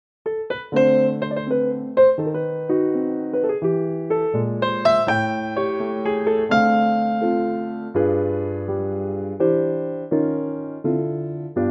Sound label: C piano; key - C - vocal range - E to G (suit lower female ranges)